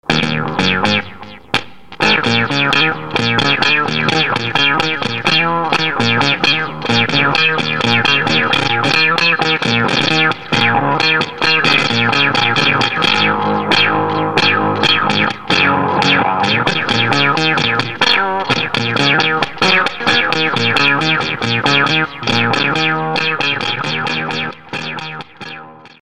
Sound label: GUITAR SYNTHSIZER